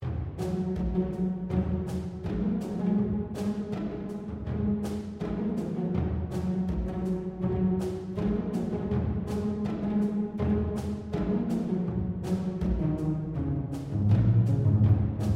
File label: Pizzicato Play